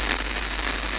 static medium; cstrike; D26 slender

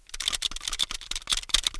start; widow

